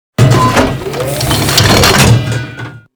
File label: t90ms reload; weapons